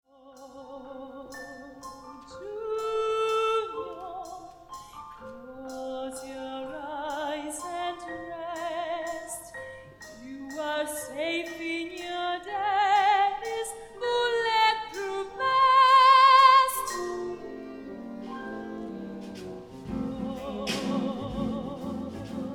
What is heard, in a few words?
lullaby